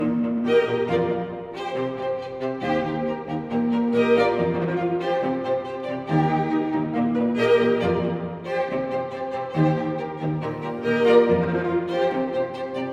Dancing Boar Short Quartet Version, string quartet